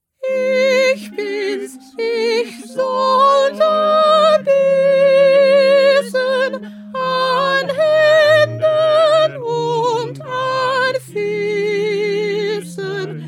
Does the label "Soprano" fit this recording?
Yes